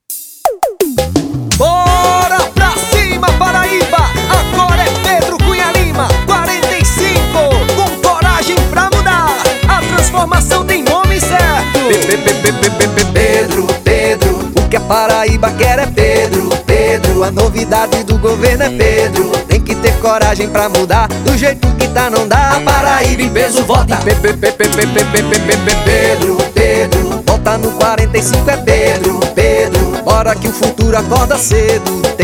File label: jingle; pedro cunha lima